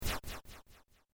Beep Electronic Slash Sequence, User interface feedback sounds; Multimedia